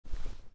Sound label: 357 Draw, Magnum; zps; Weapons; Firearms